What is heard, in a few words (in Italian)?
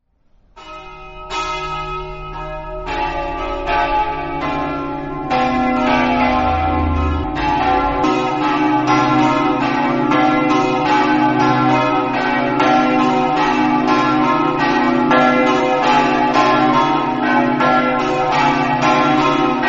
basiliano, campane